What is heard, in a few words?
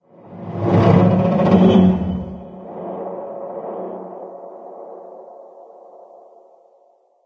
metal creak